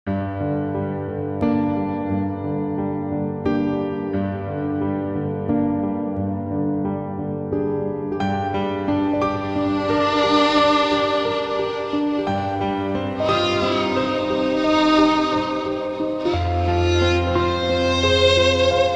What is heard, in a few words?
Violin